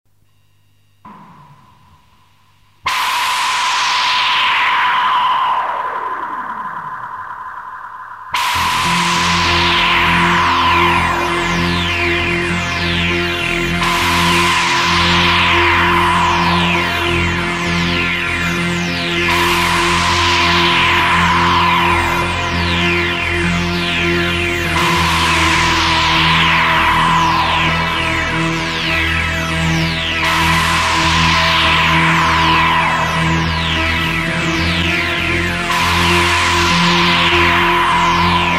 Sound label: electronic wave, Electronic music